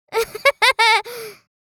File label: Girl child laughing